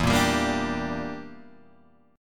E Minor 7th Flat 5th E, Guitar Open G

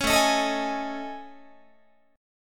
A Major 7th Flat 5th, down Guitar; AM7b5; strummed

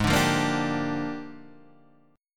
Bb 13th Ab

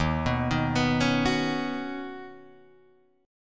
Eb Minor 7th Sharp 5th, Guitar DADGAD

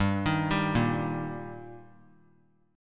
G Minor Sharp 5th G, chord; arpeggiated; JGuitar

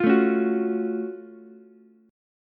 Gb Minor Major 7th Gb down Ukulele; strummed; JGuitar

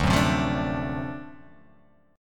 D 7th Sharp 9th D; D7#9 strummed; x,5,4,5,5,4,4,x; JGuitar

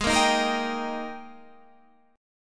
G sharp Major 7th Suspended 2nd, Guitar, Standard; 16,13,13,15,16,15; JGuitar

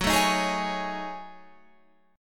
F sharp Minor 6th Add 9th, Guitar Open F; F#m6add9 strummed; chord shape

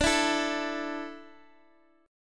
D Suspended 2nd D down Guitar Open G, chord

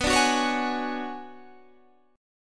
B Minor 6th Add 9th B down Guitar Standard; Bm6add9 strummed; JGuitar

